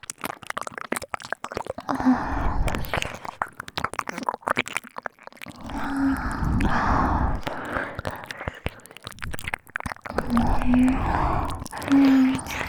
にゃあにゃあ両耳舐め(ループ対応)

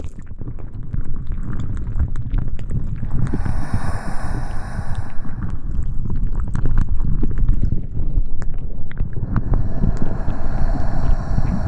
吐息＆触手; ＨＥＬＬ++; みみサキュIX

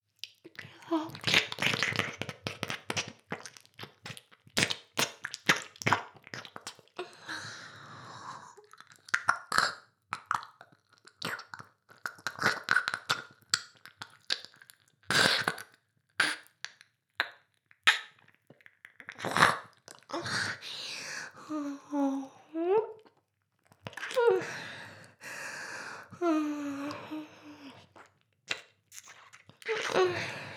耳舐め, ASMR; 全妹計画２; メスガキ妹; 全妹計画<オール・シスターズ> 2妹め

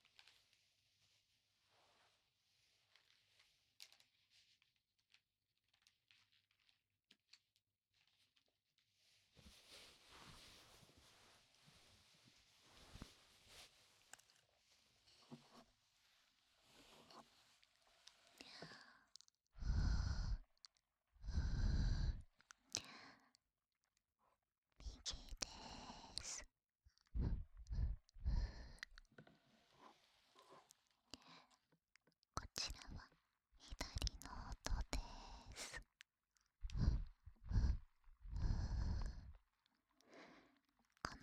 サウンドチェック

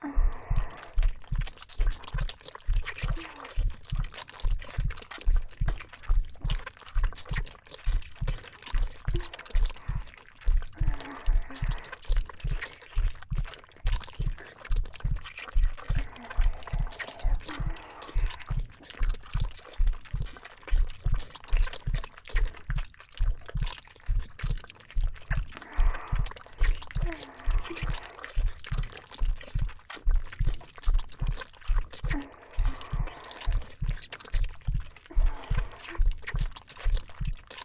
両乳首舐め フェラチオ 射精カウント; 監禁S女; ヤンデレJKまゆり; 催眠アプリのハーレム逆レイプ

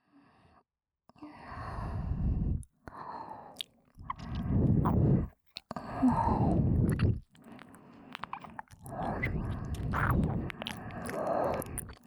奥深くまで絡みつくような耳舐めで芯から刺激を与えて, ASMR; SE無し

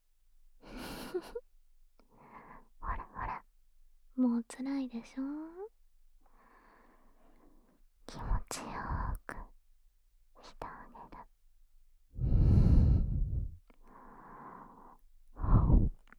癒しのマッサージ, ASMR; 癒しのマッサージ抜き

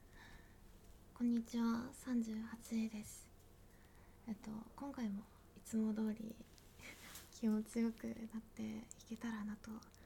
アルミホイルでイッてるのバレバレ!おもちゃを使って何度も潮吹きしまくっちゃう変態お姉さん; ガチ実演; ちょこオナ 38

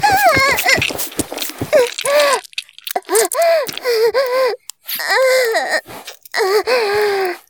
絶頂 中出し; 絶頂+潮吹き+中出し ランダム再生

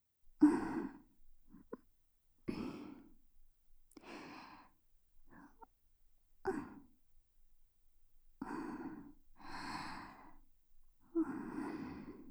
耳かき＆耳ふーループトラック, ASMR; 世話好きJK舞花ちゃん キワキワ水着で甘夏えっち♪お泊り海旅館でいちゃらぶ糖度120%な甘くて爽やかスイートサマーバケーション♪

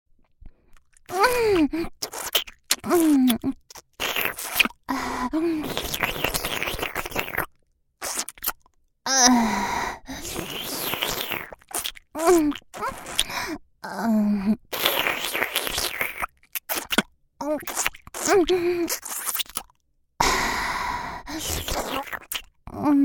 フェラ; チン嗅ぎと裏筋舐め上げ; ギャルフェラッ!!学校は援交ギャル風俗店ッ!!

